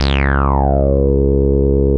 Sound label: C#3, Bass; CRANDLE; E-MU Producer Series Vol. 6 – Analogia Project (CD 1); Boom da Bass 1